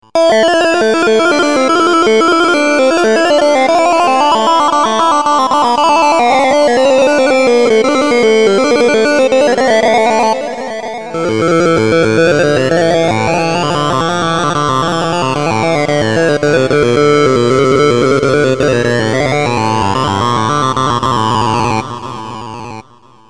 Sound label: saz flanjer; melody